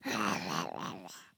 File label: growl; CosmicRage; events birthday